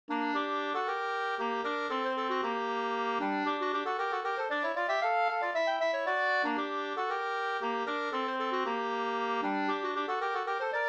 polska g moll

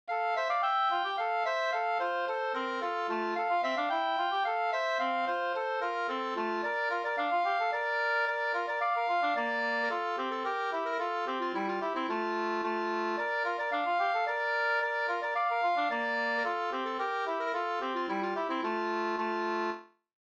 slangpolska

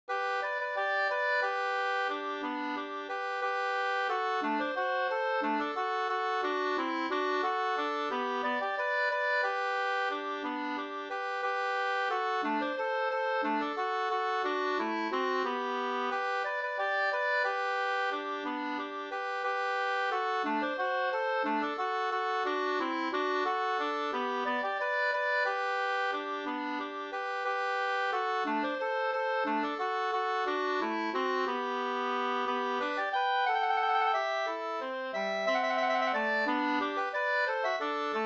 vals

